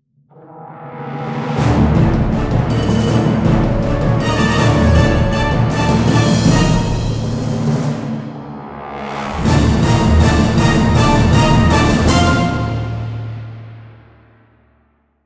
Jingle Aircraft Crash; Jack Keane

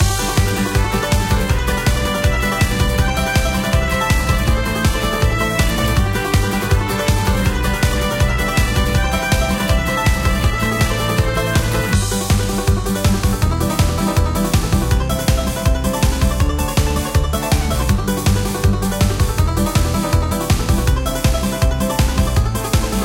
Electro; Slush Rush; club penguin

